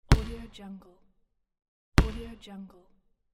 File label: basketball single bounce